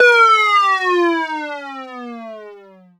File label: Dance Floor Bass, Multisample Basses